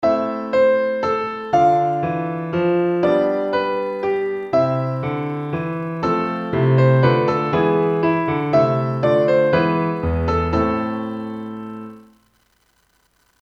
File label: menuet